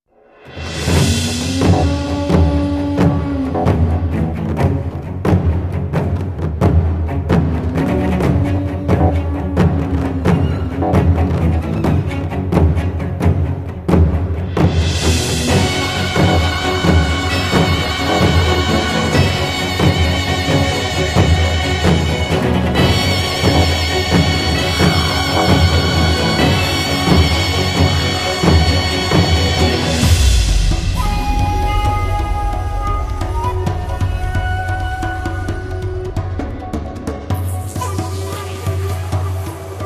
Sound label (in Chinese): BOSS战; 朱厌; Tower of Fantasy; New Lands